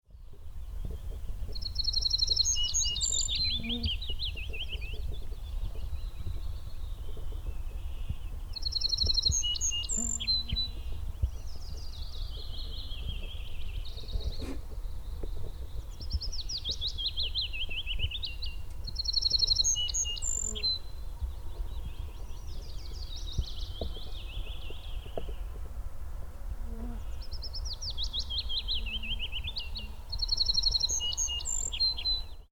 Dvärgsparv

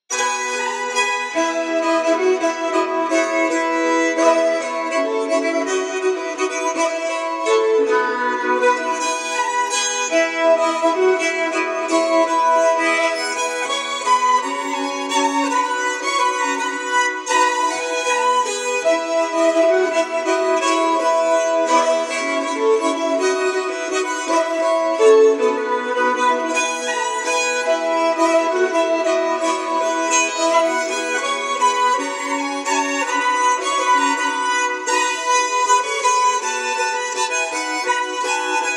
Brudvals fr Norge, bagpipe fiddler

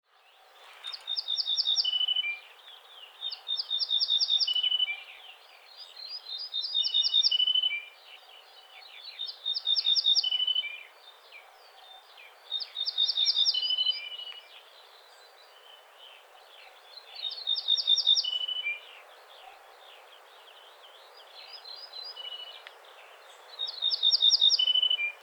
Ortolansparv

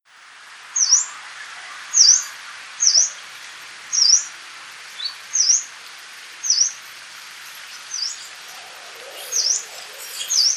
Taigasångare

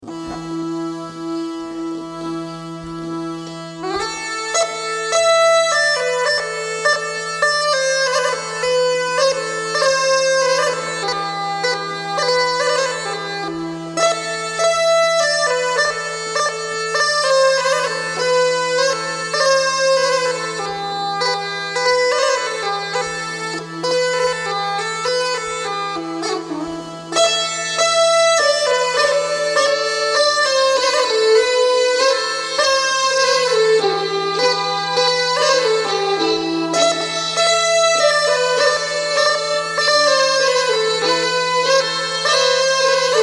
Marsch; Björnskytten

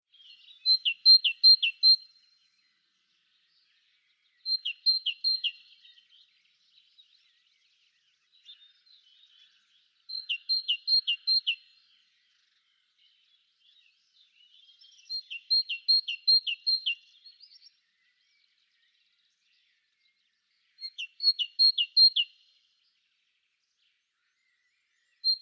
Talgoxe

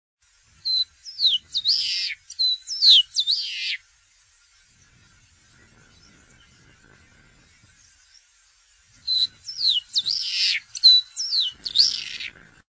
Långstjärtad ängsstrupial

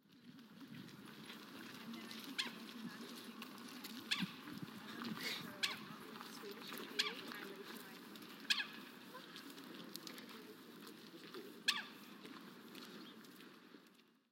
mandarinand Aix galericulata

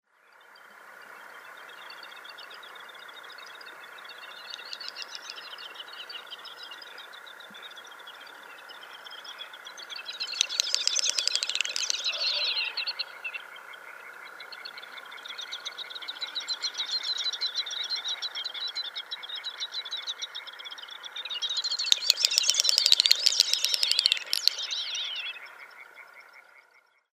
dubbelbeck

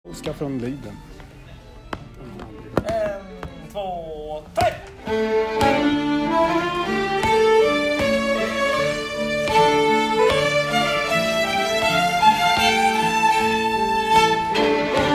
Polska Storpolska; från Liden

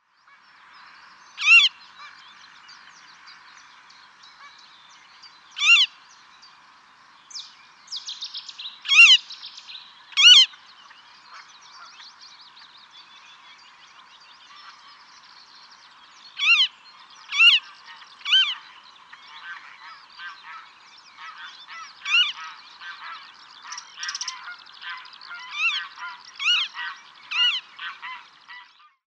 Brun-Kärrhök

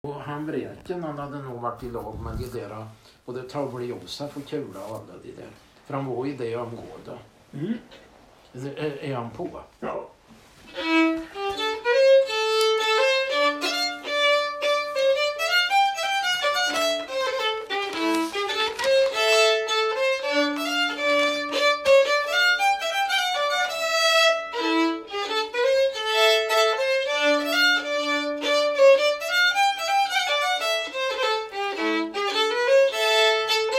Polska, bagpipe fiddler; efter Vräken bodde i Östervik på Kosia i Karlskoga

